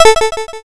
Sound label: cancel